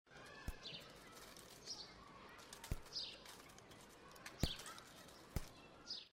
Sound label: birds wind mild chitchat football; grade1; introducing ourselves